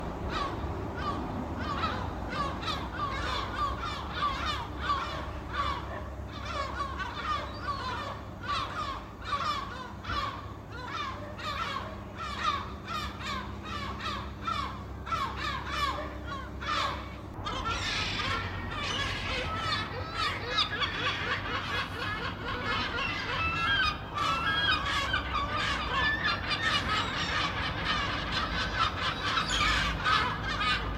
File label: seagulls